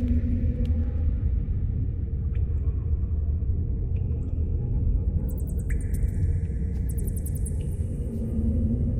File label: ambience cave; everness